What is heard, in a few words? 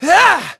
GRUNT, VOICE; Pulse Racer